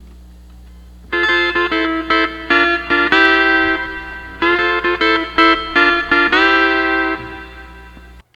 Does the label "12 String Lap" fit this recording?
Yes